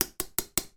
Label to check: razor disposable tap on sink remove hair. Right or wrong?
Right